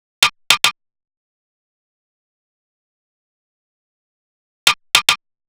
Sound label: Drum Loop 108 BPM Percussion, Experimental; Drum Loops; Loop Stems; Cleansed